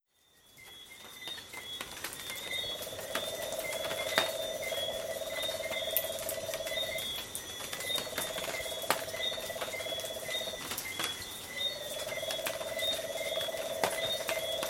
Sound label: tree frogs - insects on coast; soundscapes